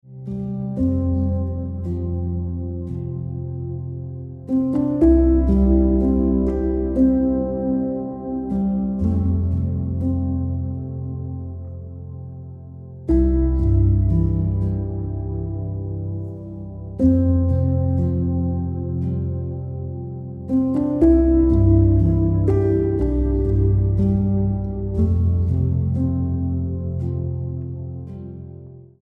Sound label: Just You Ringtone Piano Music